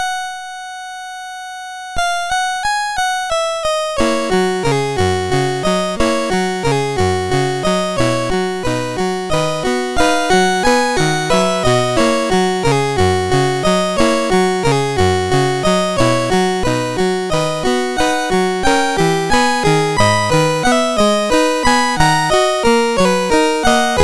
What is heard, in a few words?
Prelude in B Major, MOS6581R3; Op28 No11